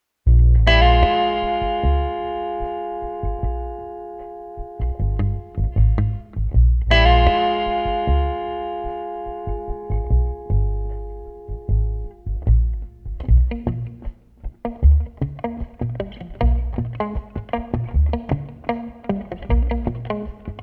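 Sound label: Street Guitar Bass Sleuth, 110 bpm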